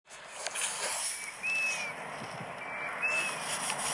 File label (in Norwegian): fuglelyd